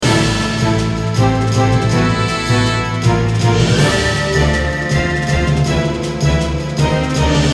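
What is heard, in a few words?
Short RPG, BGM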